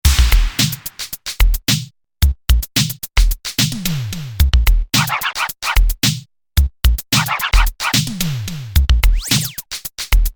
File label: TOM Contemporary